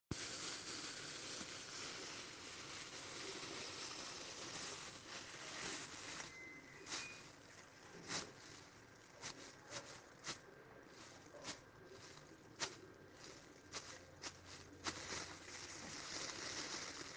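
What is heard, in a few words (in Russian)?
играем в перкуссию с двумя мешками для мусора; s babushkoj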